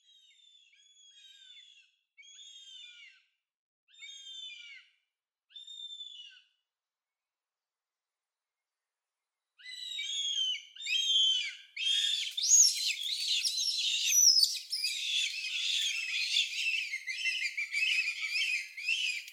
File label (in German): Habicht Accipiter gentilis Aestlinge; Vogelstimmen